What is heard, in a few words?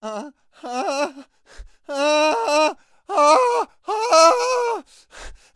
npc male scared; DeadFright